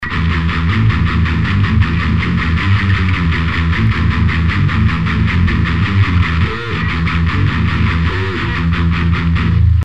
mod rhythtm combo

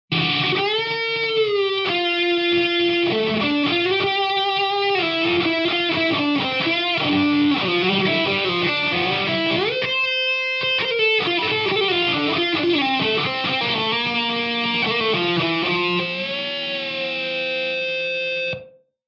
thrashmetal solo

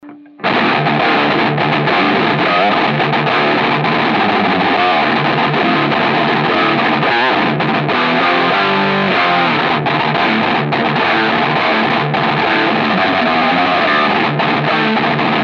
Distortus Maximus Gain

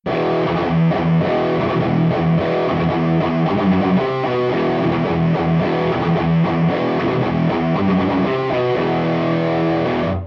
shredmaster scream

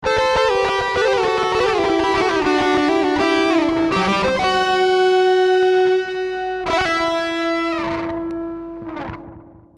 dist solo

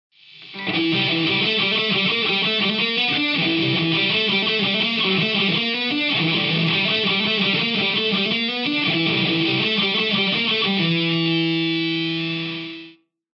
thrashmetal

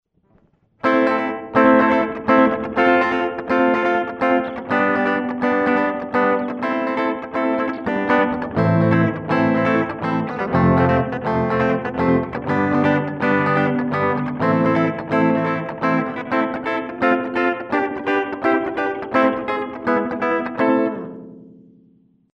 ELUR K-IV Analog Delay

